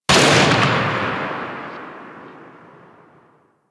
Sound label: Sniper Rifle Tail; Gorg